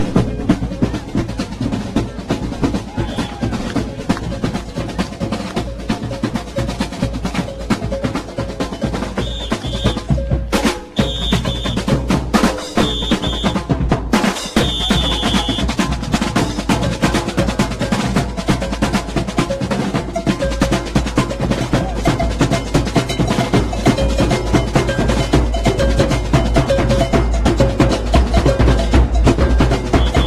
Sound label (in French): fanfare